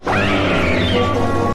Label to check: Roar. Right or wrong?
Right